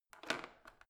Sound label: washing machine creaky rotary button; haus garten; haushalt